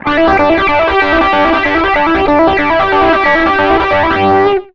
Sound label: SOLO, Electric & Acoustic Guitar Loops; USB Soundscan vol.04; AKAI; 120 SOLOS